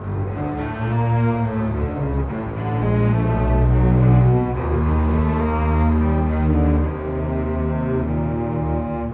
Bass Cello, Cinematic

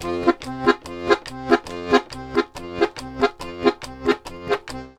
140, Complete Accordions

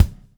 BUBBLE; Spectrasonics - Backbeat

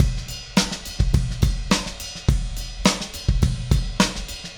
DH Beat, Indie Rock Anthems; Sonic Foundry (Sony Creative Software)

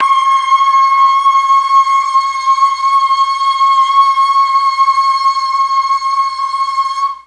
C#4 BOYS CHOIR, Mellotron; Mike Pinder - Mellotron Archive CD

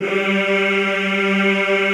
G2, Classical Choir; Best Service; Extended Classical Choir

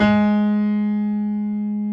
piano stereo G#2; AKAI S6000 CD-ROM - Volume 1; STEREO G-PF 2

